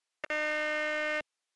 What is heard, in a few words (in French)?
Trompe pneumatique encastree, Grille noire, 12V24V, 120 dB; grille chrome